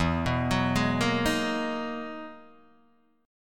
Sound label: E-Major Sharp 11th E, Guitar DADGAD